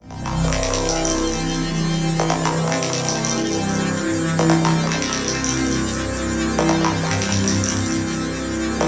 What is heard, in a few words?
Unreal Pad; Cymatics; Noise Osc Samples for Serum